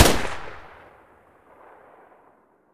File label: muzzle vector mono, weapons